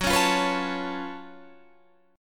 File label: Gb Minor Major 11th Gb down Guitar Standard; GbmM11 strummed; JGuitar